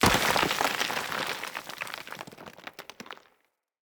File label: Impact Debris Medium Dirt; Weapon Impacts